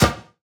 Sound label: Acoustic Drums - Perc; Heavy Acoustic Drums; Antidote Advent